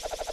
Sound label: book Spin Loop, cheer Readers; HeavenStudioPlus